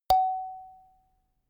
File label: notification kalimba single high